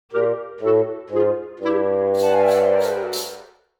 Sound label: Mobile game playful fun fail lose